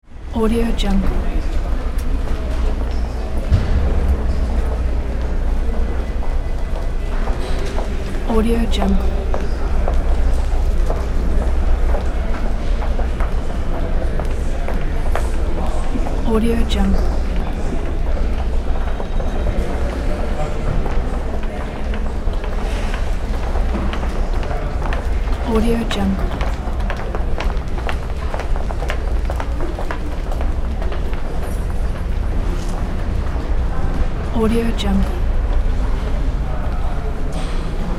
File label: hotel ambience